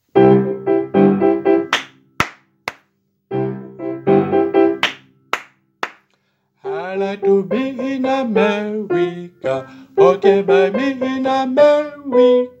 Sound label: America soprano